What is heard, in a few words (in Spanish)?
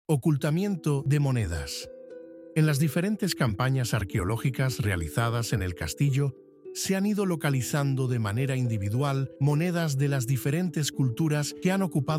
audioguia castillo música